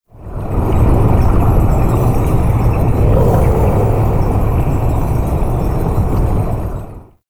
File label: Interior Orbital Station Wind; Warframe; Tau